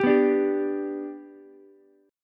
B Minor Sharp 5th, Ukulele; strummed; JGuitar

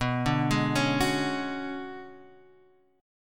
B Minor 6th Add 9th B down Guitar Half Step Down; Bm6add9

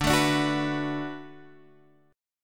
D 6th D down Guitar Drop D, chord

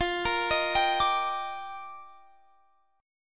F 13th Suspended 4th F, chord

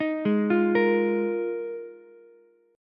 F Minor 11th, Ukulele C6; Fm11; arpeggiated; JGuitar

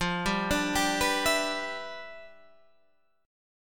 G 6th F; G6/F arpeggiated

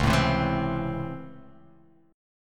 C sharp Minor Major 7th Sharp 5th

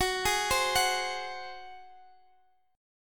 Gb Suspended 2nd Flat 5th; arpeggiated

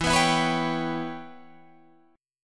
E Minor 6th guitar chord, Open G

